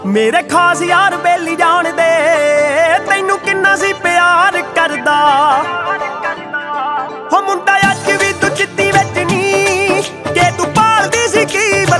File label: Punjabi Ringtone